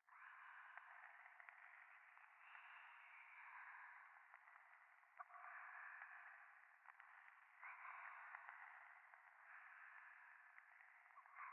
左耳密着処女喘ぎ 暖炉

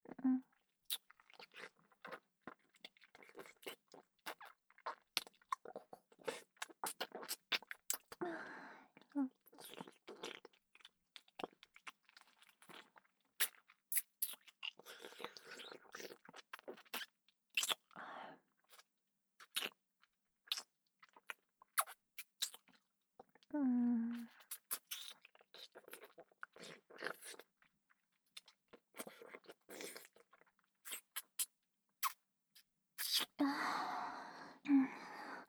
乳首舐め, ASMR

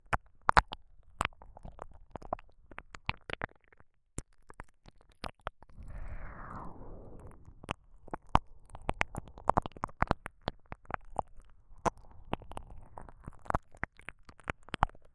右耳舐め ループ対応

